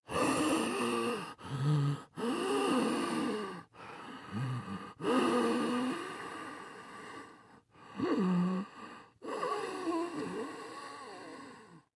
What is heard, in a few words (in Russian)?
zombi tyazheloe dihanie